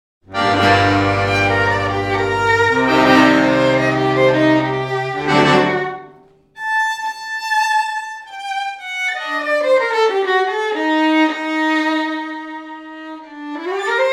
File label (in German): Tango Werbung